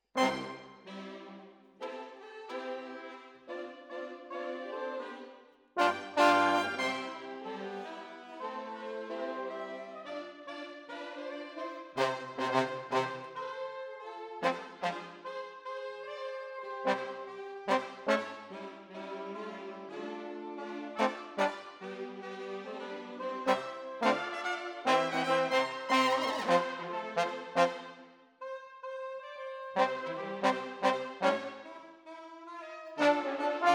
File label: Trombones, jazz for piano